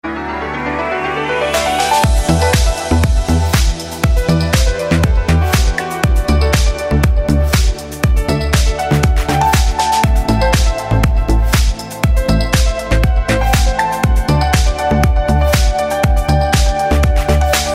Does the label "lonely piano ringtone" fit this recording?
Yes